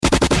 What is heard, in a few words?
sound gun